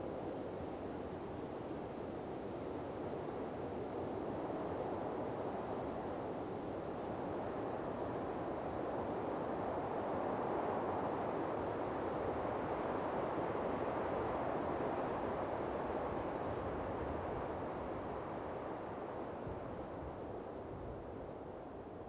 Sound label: Wind Light Steady Wind; Poetry Project